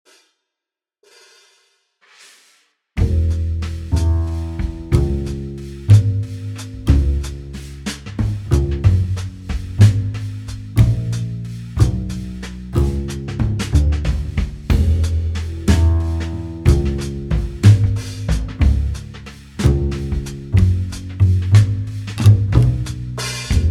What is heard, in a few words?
backing in e